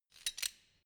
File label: wrench slide close soft; schrauben drehen hydraulik